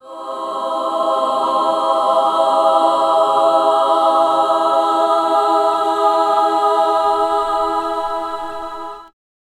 gospel

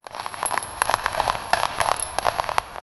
very slowly going a stone

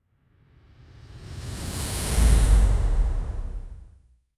whoosh swoosh ascendent

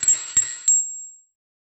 cash register completion; retail

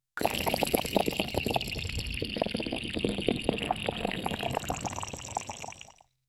sound of a character slow, potion

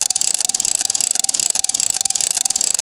reel spin sound, casino

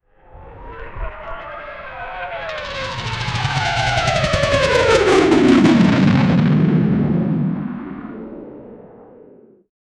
ballistic

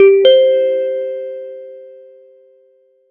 short synth blip positive, electronic beep

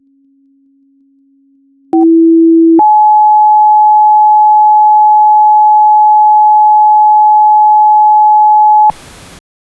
short success feedback; short success feedback so

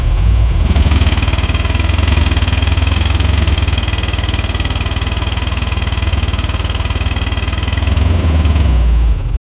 mid range diesel engine; accelerating

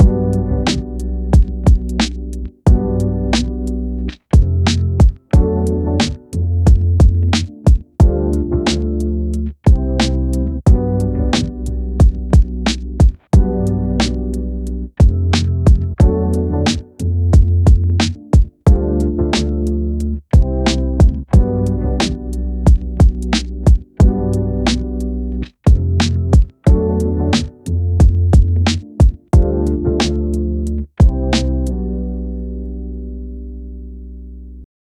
loop 90 bpm, lofi; 33s

